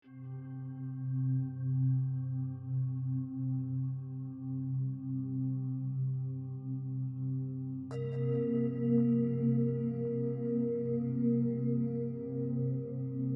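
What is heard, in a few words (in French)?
Sérénité, les émotions; Sérénité 3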